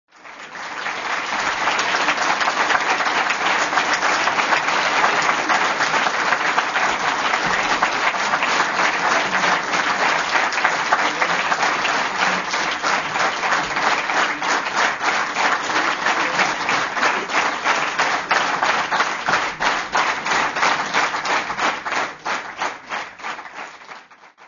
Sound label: ambiance applause